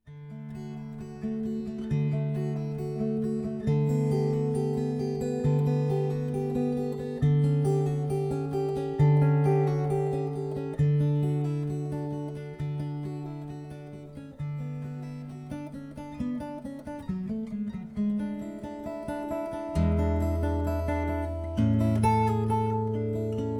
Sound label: cello